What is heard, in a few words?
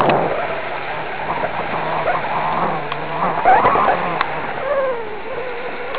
noisy hole